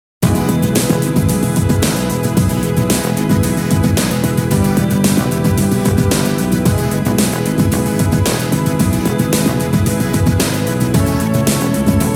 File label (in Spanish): Jingle